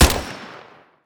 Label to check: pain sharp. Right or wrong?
Wrong